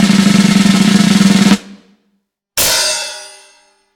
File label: Drum Roll Reveal; facts channel